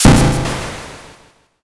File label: thunder magic